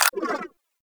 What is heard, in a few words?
Briefcase Akimbo Pistol Mech; Warframe; Corpus